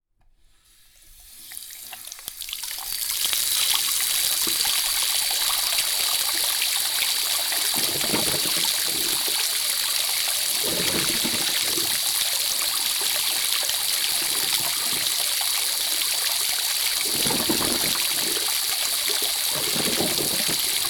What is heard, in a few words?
Water Basin, bathroom